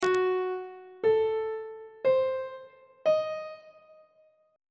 f sharp diminished 7th chord intervals on treble clef